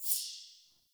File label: UI XP Tick; Hud UI